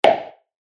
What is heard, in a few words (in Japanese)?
足音 コンクリート 単音 二歩目; モノラル